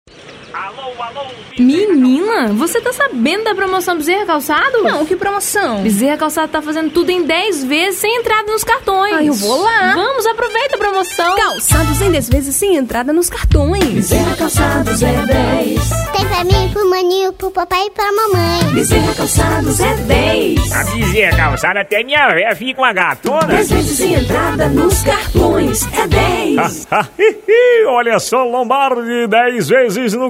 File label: jingle; Bezzera Calçados